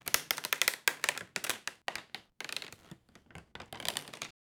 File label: a piece of plastic board, impacts